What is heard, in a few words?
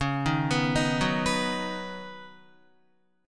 Db 7th Suspended 2nd Suspended 4th, Guitar; 9,6,9,8,6,6; Db7sus2sus4 arpeggiated